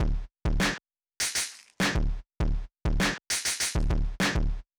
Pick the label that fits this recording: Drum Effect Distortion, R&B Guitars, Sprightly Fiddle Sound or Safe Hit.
Drum Effect Distortion